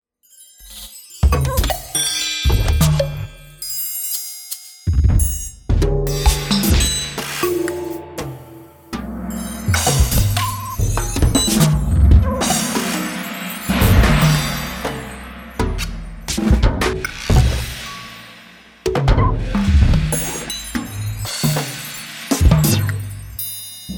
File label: Percu